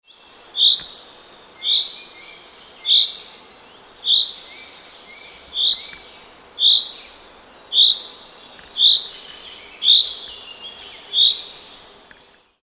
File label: chaffinch